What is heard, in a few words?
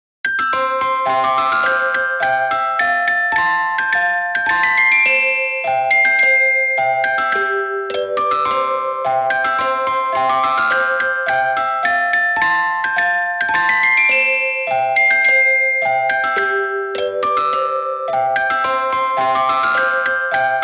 Ice Cream Truck, Dixie